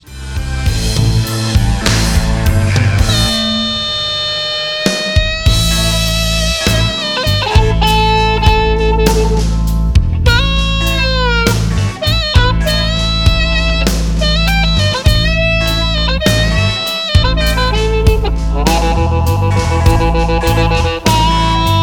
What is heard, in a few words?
harp solo